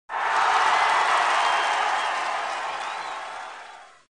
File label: applause football